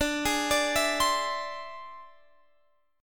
E Augmented 7th D

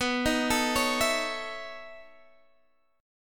B 11th B Guitar DADGAD, chord

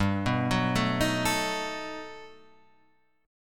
G Augmented Major 9th, Guitar, Standard; G+M9 arpeggiated; JGuitar

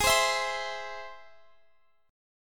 G sharp Augmented, Guitar, Drop D

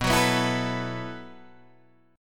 B 7th Suspended 4th B down Guitar Open D

